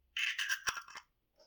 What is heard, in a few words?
egg cracking; fowl-play; slingshot